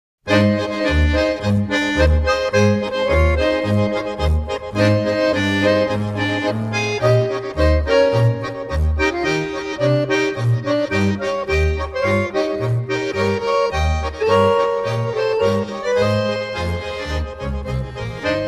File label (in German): Gemmi-Marsch